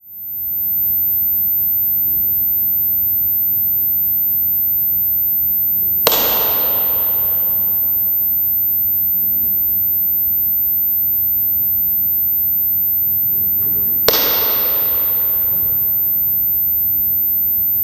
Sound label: stairs reverb; bapst